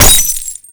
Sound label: bullet glass; weapons; Modern Warfare